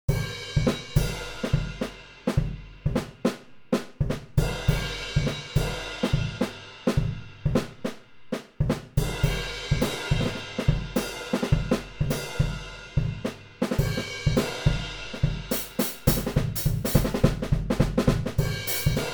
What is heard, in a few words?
Full kit, drum-sets; Natural Drumsets